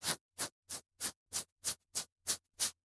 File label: body write